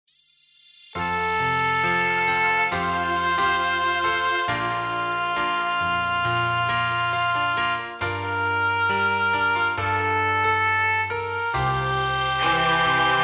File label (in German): Vocal Mix